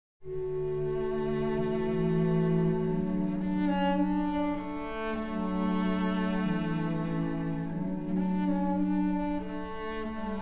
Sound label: Concerto for Cello in D, Affetuoso; Vivaldi; Complete Cello Concertos